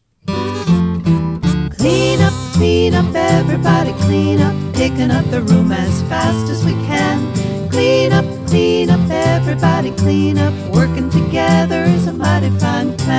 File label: clean up song